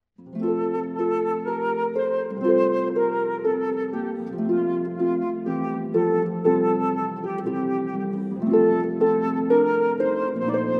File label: Flute Harp; Ode to Joy; De ode op fluit en harp; Volkslied van EuroStaete